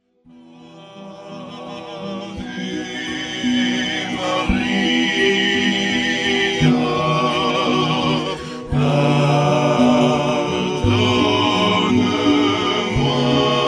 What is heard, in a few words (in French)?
Ave Maria SSAATB Fa mineur